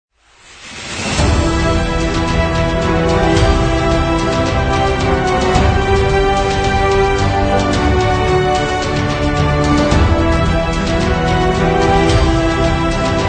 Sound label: Epic